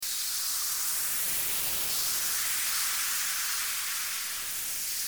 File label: steam; Mindustry